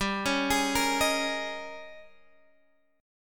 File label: Ab Major 7th Suspended 2nd Suspended 4th G